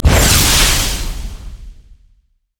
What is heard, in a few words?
lightning rush; LoL; kennen